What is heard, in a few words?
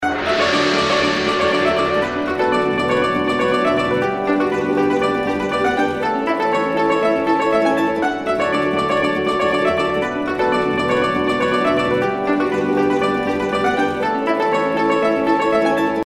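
intro background music; Princess Wencheng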